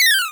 Zap; Bitkits

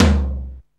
TOM, Alesis Hr16, Drums

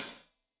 Hat Loose, Hi-Hat, Cymbals Stereo

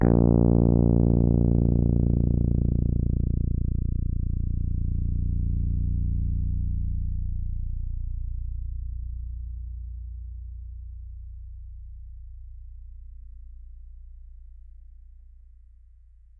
A#0, Rhodes Mark II; mkII 88 key HI; CEPCo Sample Series Vol. 3

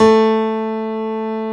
PIANO, Pianos Mixe

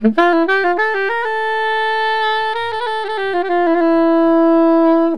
SAX, Balkanish Traditions

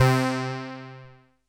JUNO BASS

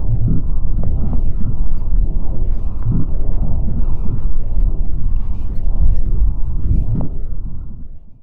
loop, techno atmos

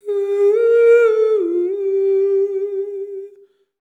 FALSETTO; Spectrasonics; Symphony of Voices

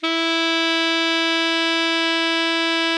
ALTO MF E 3, Sax; Greytsounds; Philip Wolfe David Crozier's Saxophones

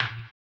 HAMBONE, Vocal Blues Soul; Spectrasonics; Planet

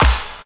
COMBI, Houseworx; Ueberschall; Workz-9

